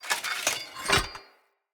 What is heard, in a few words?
Two Handed Mace Equip A; Weapons; Enemy; Blunt; Sinecure Two Handed Mace